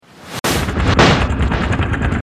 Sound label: motorcycle; Jesse McConnell